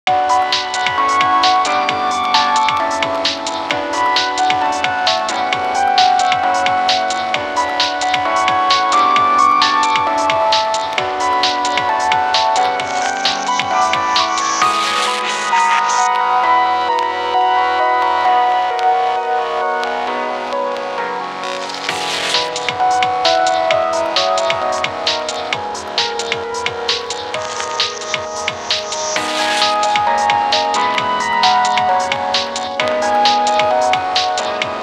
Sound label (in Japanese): ノイズ混じりの世界